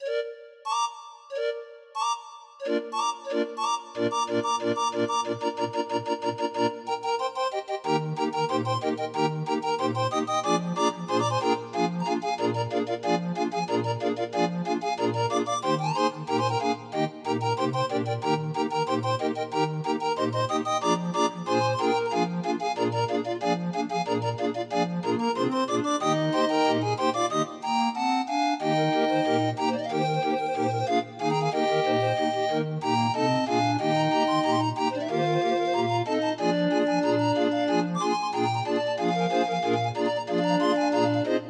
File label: Grind Organ; 31 note